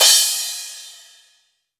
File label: Crash